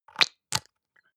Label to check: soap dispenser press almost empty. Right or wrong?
Right